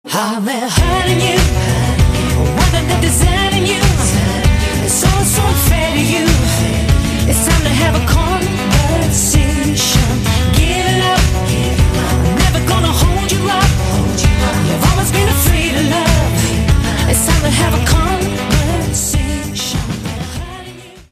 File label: The Conversation ringtone